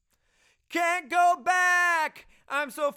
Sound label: LV Choru